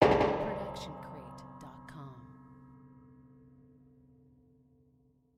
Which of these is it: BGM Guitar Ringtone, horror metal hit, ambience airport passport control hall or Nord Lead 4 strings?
horror metal hit